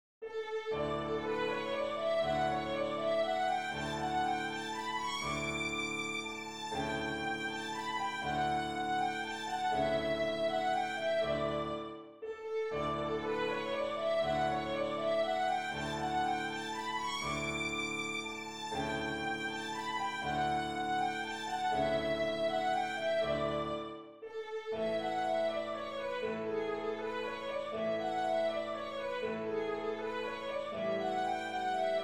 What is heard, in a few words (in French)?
danse allemande; beethoven ludwig van